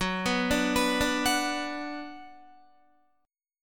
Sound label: Gb Suspended 4th Sharp 5th, Guitar; arpeggiated; JGuitar